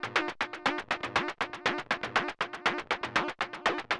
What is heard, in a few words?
synth loop 120bpm; ultimate pack